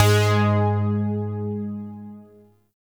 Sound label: Shawzin Oct One G Sharp; Zariman Shawzin